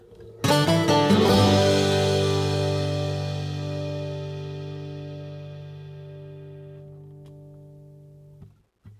Shooting Duck Outro; The Oregon Trail American Settler

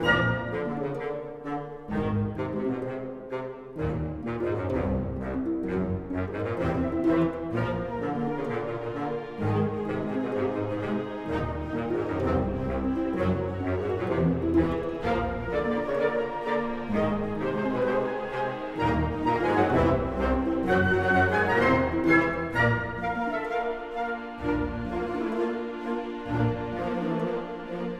Comical 64bpm Loop; Music Dialogue

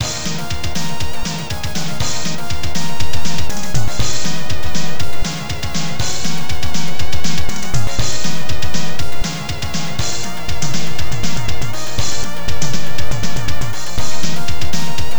Final Battle; Ys I; Ancient Ys Vanished; X68000

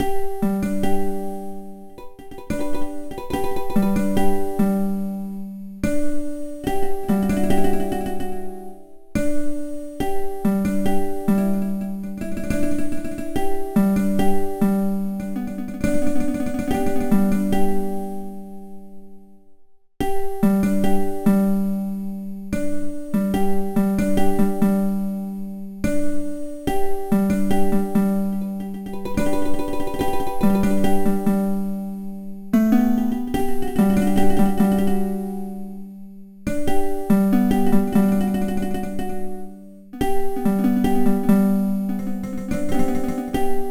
Death Harp; Soul Bubbles

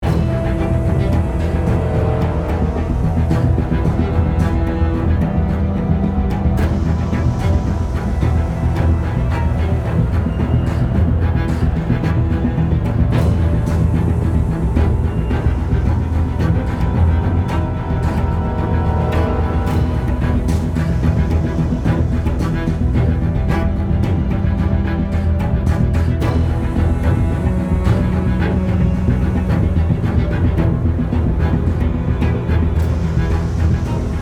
Stealth (Loop); The Walking Dead: The Telltale Series

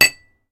Impact glass hard; Puddle; puddle plus ios gamerip 2018

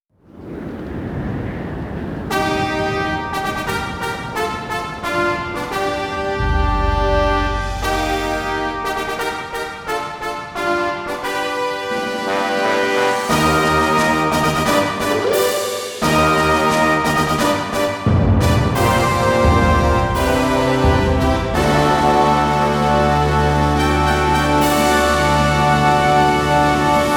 Fanfare - Cairo Racecourse; starhorse original sound track

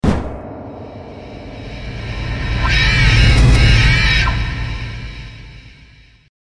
Pig Clobber; Saw The Video Game

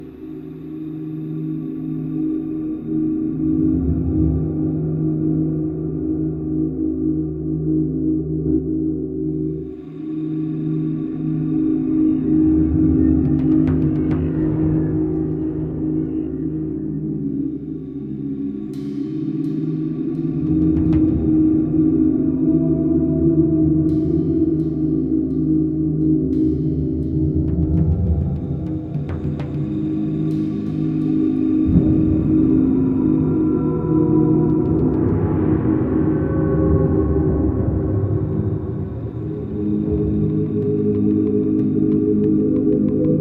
Ghostly Voices; Pariah

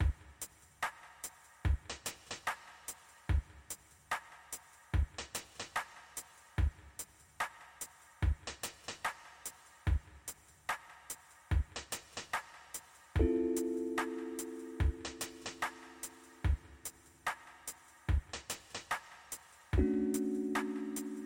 Loading; Super Cube Cavern

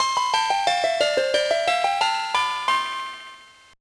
All Candies Collected; The Polar Express; GBA

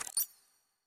Cat blink

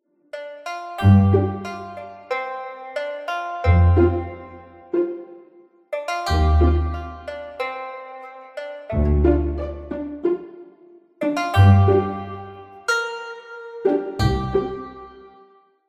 Upbeat Dialogue Loop; BIOMUTANT

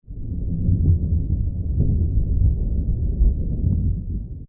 SFX Thunder Rumble; Escape from Russia: Mobilization

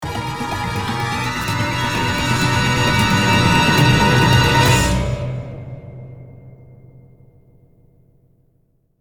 Death Stinger; The Walking Dead: The Telltale Series

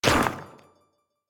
Break; super mombo quest

